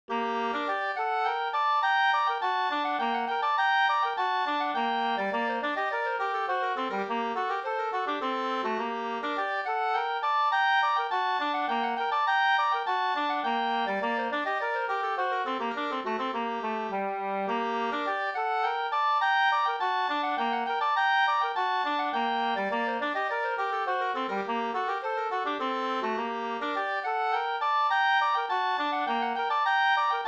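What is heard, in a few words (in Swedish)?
slangpolska, two-part; Stigtomta Sd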